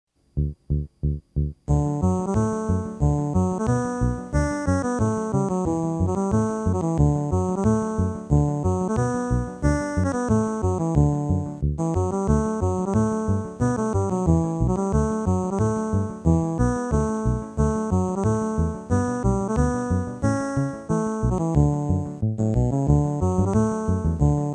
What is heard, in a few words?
air jam